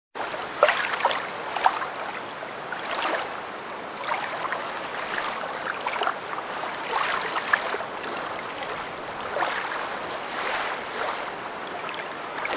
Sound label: Water lapping; Lighthouses Ocean Water